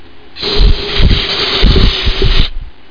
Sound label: Spray Can